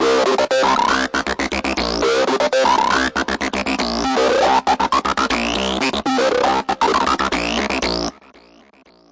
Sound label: 119 bigbeat synthline; SYNTH AND ELECTRONIC LOOPS